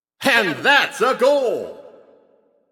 Goal Scored; H0wRd C0Sine; Driftball

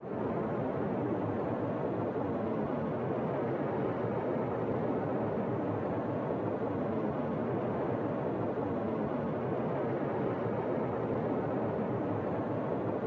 Rocket Long Idle Loop

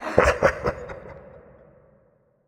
Laugh; Spinal Serve; Wieldables

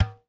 Tennis Racket Ball Hit Light

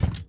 Player Grab

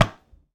Tennis Racket Ball Hit Med

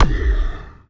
BallHit Hard Breathy; Spinal Serve

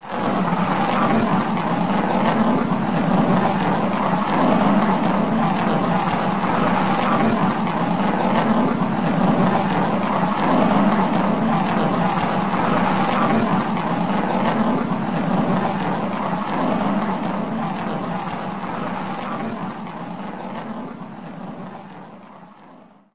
Hand Rolling Carving Layer

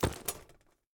Tether Pull Out; DriftMine

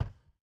Joystick Pass Center; Deathrun

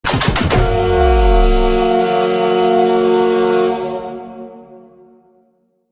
End Game

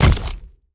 Pulse Wrench Hit Ground Other

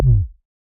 Ball Collision Forcefield Light

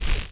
Baseball Bat Bolt

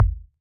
Ball Collision Geo Tackleball Light

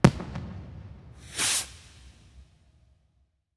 Celebration Fireworks

